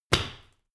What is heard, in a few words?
medicine ball catch, fitnessstudio